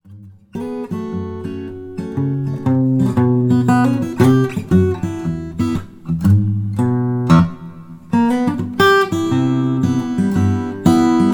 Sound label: Blues